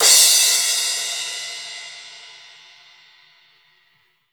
Drums Acoustic, RAW KIT; AKAI; 90s sample CD; USB Soundscan vol.10; RAW KIT 3

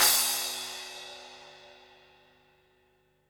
FX Crash, Drums Acoustic; USB Soundscan vol.10; AKAI; 90s sample CD

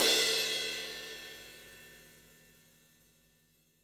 FX CRASH, Drums Acoustic; FX KIT; USB Soundscan vol.10; AKAI; 90s sample CD

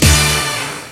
HOP, HIPHOP; Rubber Chicken Software Sample Bank

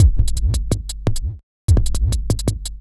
Break Beat, Drum N Bass; Sonic Foundry; Knutrix Drum & Bass

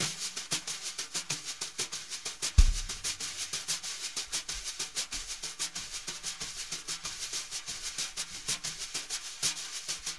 BRUSH LOOPS

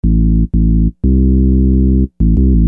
Groove Bass, Hip-Hop; Construction Kit; Sonic Foundry; Hip-Hop Street Beats Construction Kit

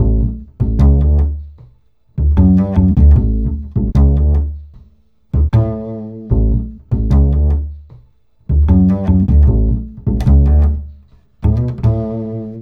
Acoustic Bass

